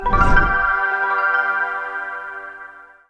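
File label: sting box lantern; latern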